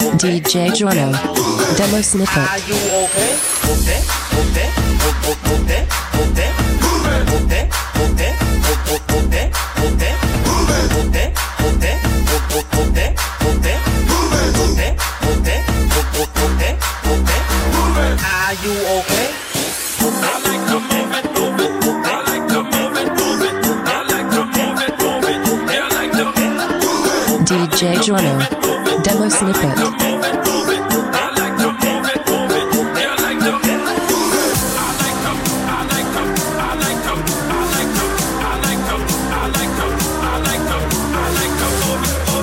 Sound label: 132 bpm Dm